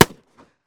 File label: shot dist; weapons